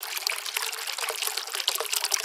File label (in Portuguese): Sfx (Versao 2) - Deslizando (Loop); Neon Souls; PS4 PS5 switch windows xbox one xbox series xs